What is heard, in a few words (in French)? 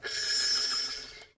violon sinola diff